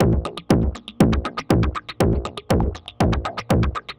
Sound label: Tech Beat 120 fx, techno